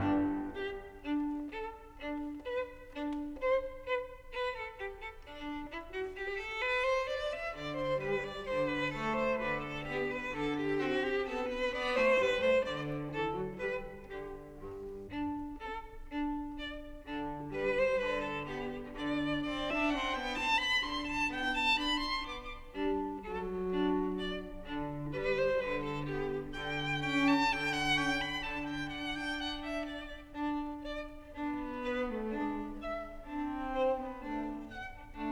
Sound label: in G Major, Cello; 10 Variations on Ich bin der Schneider Kakadu; Op. 121a Variation VII